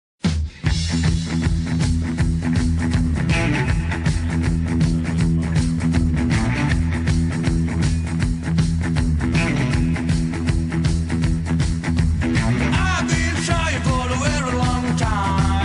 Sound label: ringtone